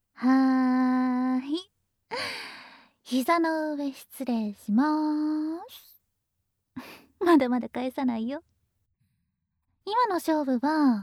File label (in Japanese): 耳舐めパンツ素股, ASMR